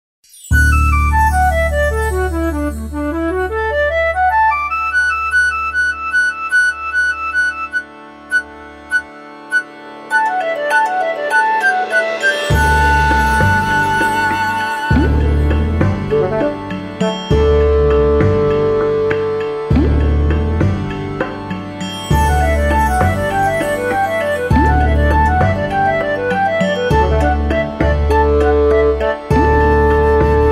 Pond Dance

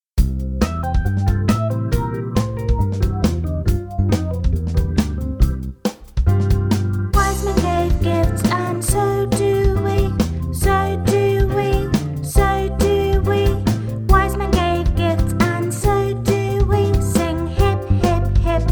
Sing Hip Hip Hip Hooray

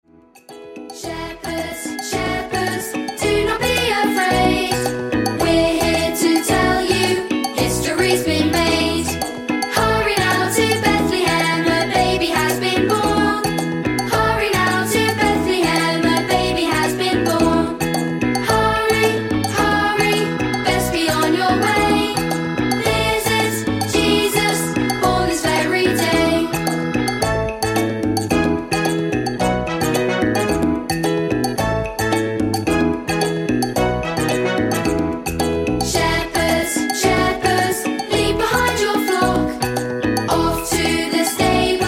Shepherd Salsa

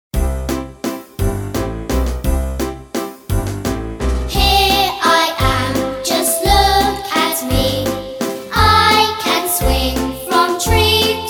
Funky

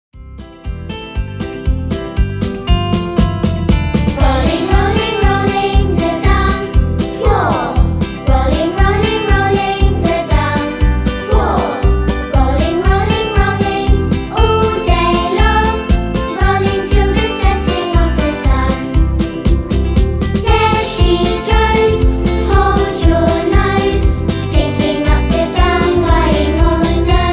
Dung Beetle Song

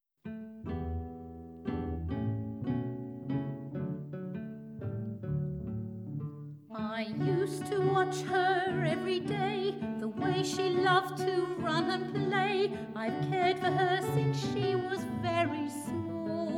Teenager Blues Verse 2

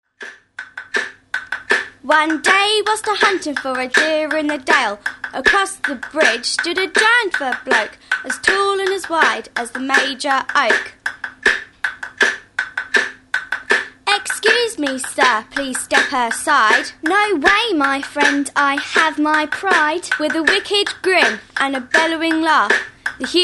Rap

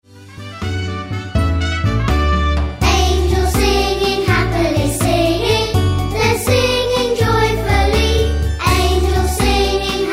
Angels Singing

